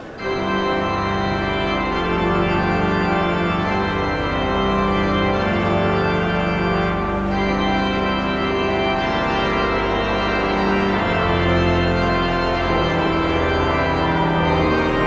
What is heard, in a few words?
Postlude